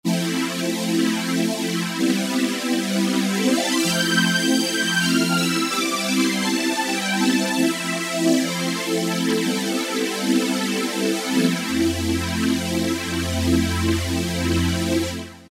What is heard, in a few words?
Combo, Yamaha TG500